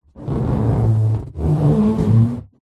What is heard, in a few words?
balloon inflated rub muffled; zapsplat